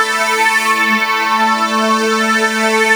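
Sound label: extra lead string (single note); Sonic Foundry (Sony Creative Software); Ugly Remnants VOL-2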